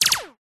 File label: science fiction weapon gun silenced multiple shots fast automatic